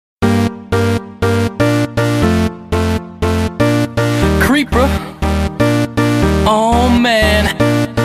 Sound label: creeper oh man